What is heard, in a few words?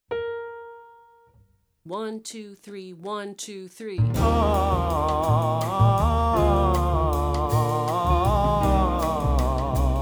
High Latin, Vocal Warm-ups